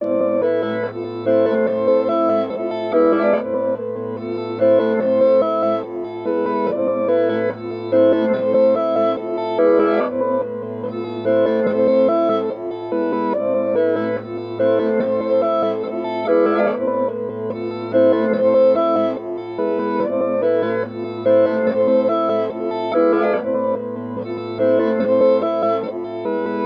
144 BPM A Min Main Pad; Melody; Loop Stems; Reverse; Cymatics; 2022 Melody Collection; Aim

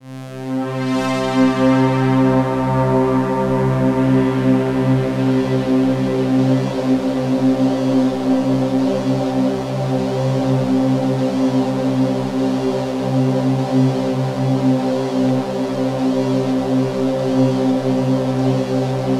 Atmosphere C; Ghosthack; Free Male Vocals and Atmospheres

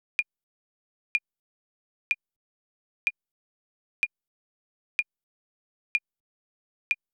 Drum Loop - 125 BPM Clav; Pepper; Cymatics - ANALOG EVOLUTION Beta

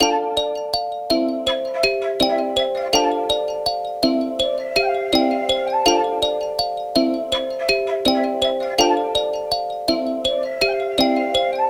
164 BPM A Min, Hip Hop Melodies Virtual; Holy Lands

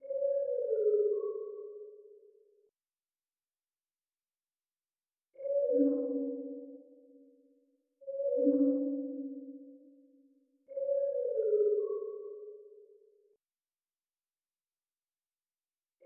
90 BPM F# Min Accent Synth; Loop Stems; Cymatics; Lucid

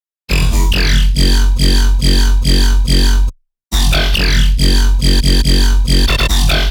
Riddim Bass Loop - F# 140; Squirming; Ghosthack; Riddim Essentials Volume 2

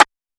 Rimshot Snare; Drum One Shots; Snares; Cymatics; Cobra Hip Hop Sample Pack

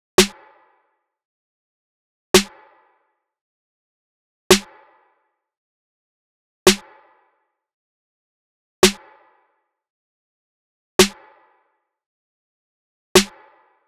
Drum Loop - 111 BPM Snare, RnB; Loop Stems; Forever

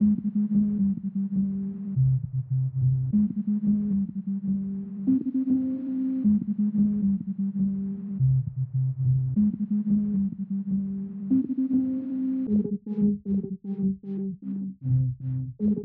77 BPM C Min Dark Bass; Cymatics; 2022 Melody USB Expansion; Origin

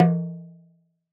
Talking Drum; World Percussion; Cymatics; Emperor World Percussion

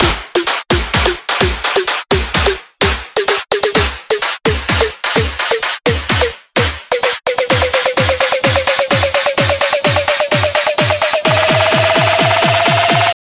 Drum Buildup, 128 BPM; Drums; Loops; House; Cymatics; House Starter Pack

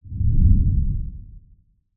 Growl, Whooshes

